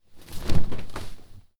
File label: clothes hit drop furnitures